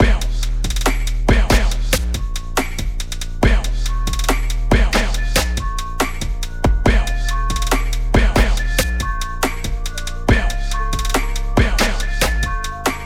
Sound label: Instrumen